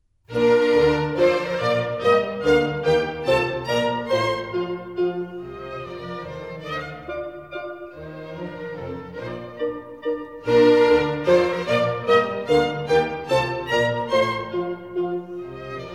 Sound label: Minuets, Bb Major; Mozart; Dances and Marches